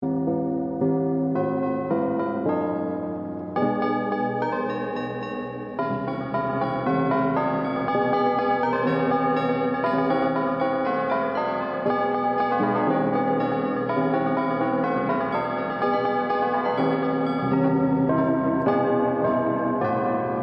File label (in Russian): Kolokola